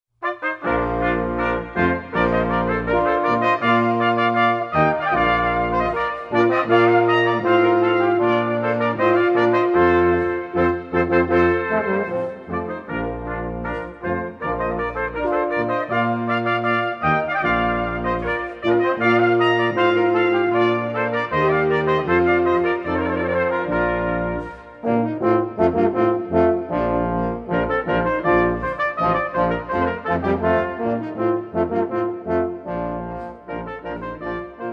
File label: Brass Quintet Rondeau; Jean-Joseph Mouret